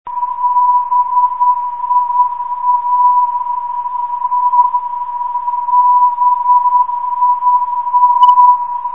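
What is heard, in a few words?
bia 474khz, non-directional beacon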